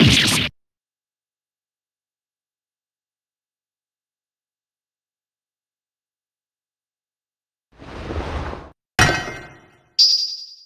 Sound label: breakdown; soj-gaspen